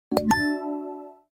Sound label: samsung notification